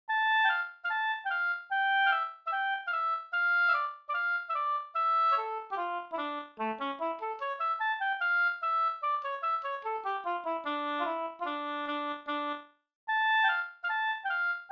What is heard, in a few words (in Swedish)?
schottis; Trollsjogubben e Millerste-Kal Osteraker Sd